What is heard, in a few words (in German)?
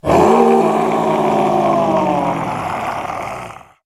Monster